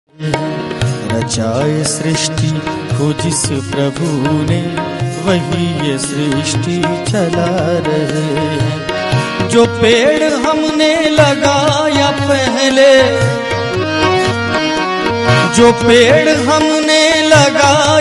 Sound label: devotional hindi